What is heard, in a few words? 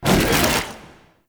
Broken Frame Dodge; Warframe